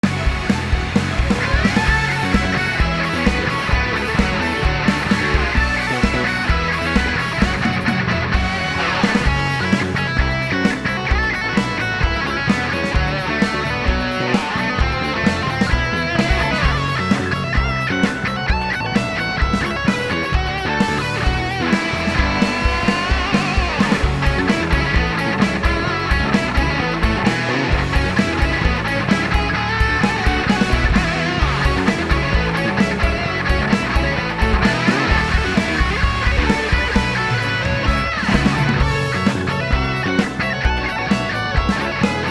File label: Rock Cover; Paper Mario - The Origami King